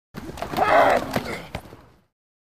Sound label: Football Hits Grunt